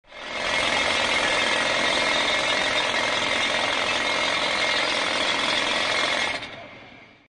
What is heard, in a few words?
Pneumatic Drill